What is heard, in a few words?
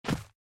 player land; Adventure Time - Break The Worm; ThrillGames